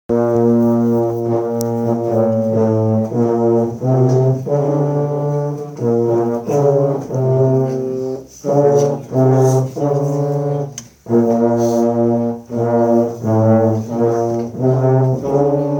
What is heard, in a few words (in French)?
tuba et trombone